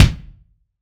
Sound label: BD, BASS DRUMS; EdgeSounds